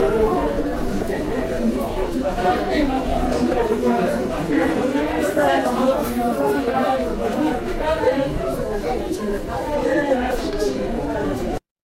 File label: crowd murmur